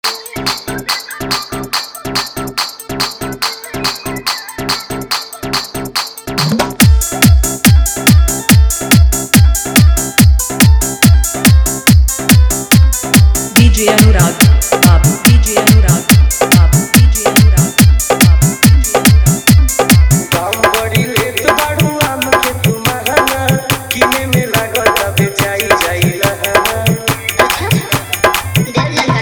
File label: Bhojpuri Faadu Hard Bass Mix